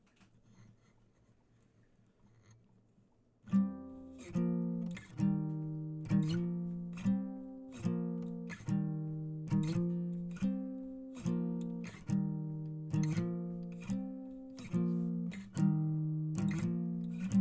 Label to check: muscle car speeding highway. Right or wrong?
Wrong